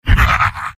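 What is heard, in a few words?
laugh evil, male; soldier; tf2; garrysmod; mvm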